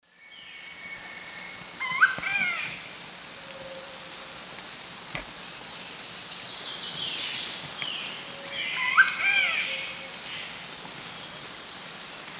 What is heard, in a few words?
Celeus grammicus, calls; S. America; Eastern Lowlands